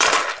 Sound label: trash; Junkbot; lego creator